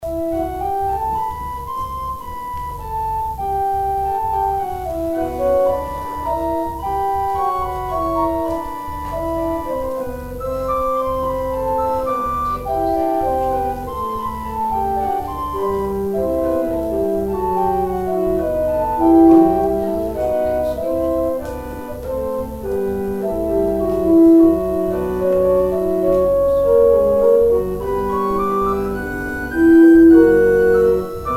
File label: organ interlude; Offering organ interlude